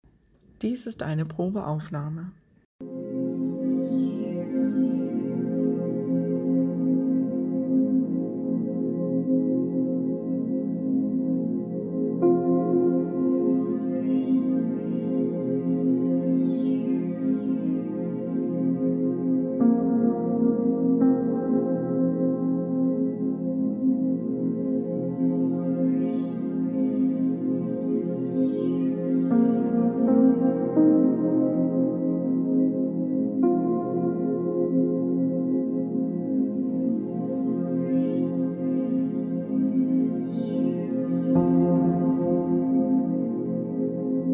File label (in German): 528 Solfeggio Hoerprobe Quiet Earth